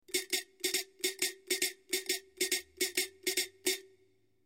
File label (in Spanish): toca tala; lata; reciclar tocar